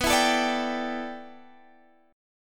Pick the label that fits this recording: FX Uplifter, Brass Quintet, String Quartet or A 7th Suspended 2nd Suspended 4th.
A 7th Suspended 2nd Suspended 4th